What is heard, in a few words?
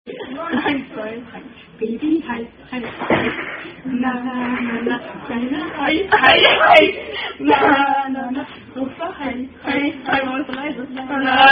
German Attack Song